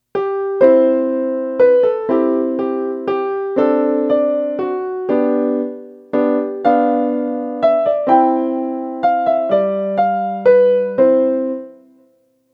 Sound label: Waltz; Clementi